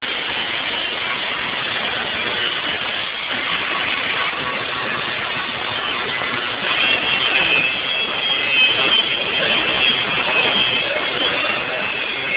grinding; Sail Videos

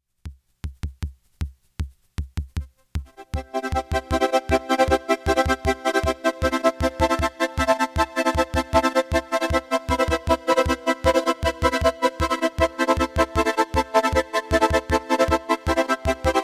TREMOLO FX; The ATK knob sets the tremolo speed then a clock is applied.

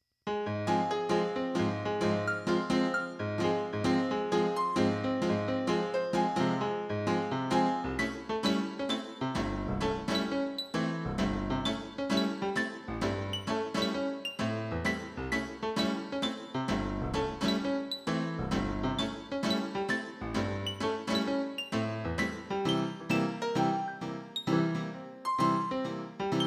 Melisma Loops FX

